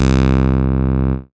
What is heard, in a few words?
Acid Bass, Dance Synths